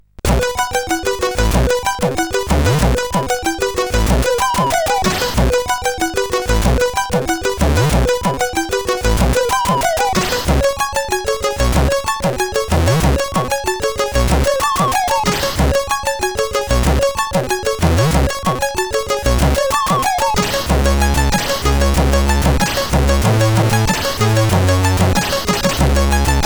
loader, CSG8580R5; sid

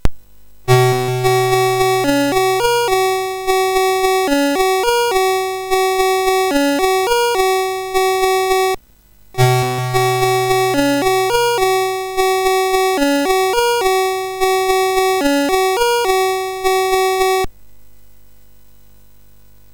sid MOS6581R4, GAMES; Forest of Doom

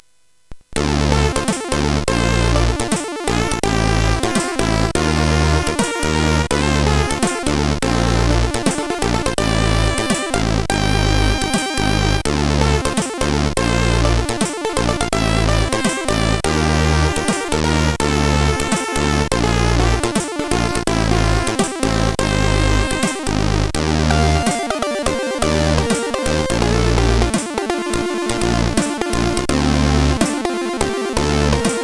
sid CSG8580R5; Stormlord 2

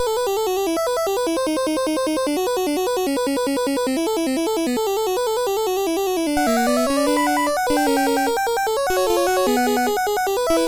Fugue, CSG8580R5; Fourth Movement

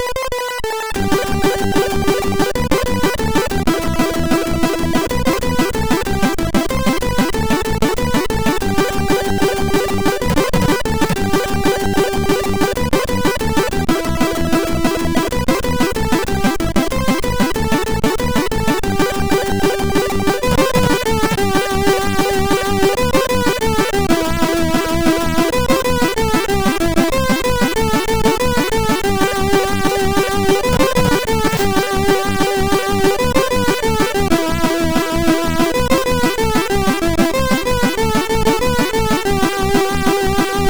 Humppa, MOS6581R4

